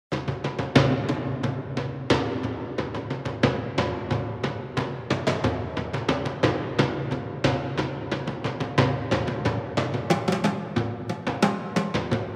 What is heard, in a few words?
Tiny Toms; Strikeforce